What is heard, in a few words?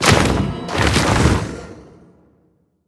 Bodyguard; Hero Mortemis